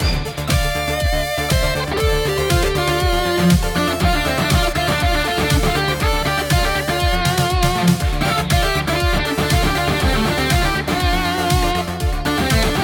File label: solo mockup